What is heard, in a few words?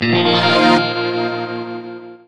Xp Level Up; Agents of Storm